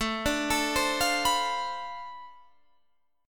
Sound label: D Minor 13th A